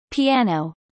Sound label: piano